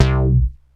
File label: Bass Synths hits and octaves; FM 57 - The Ultimate Sample Collection (1997)